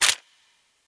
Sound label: Weapon Foley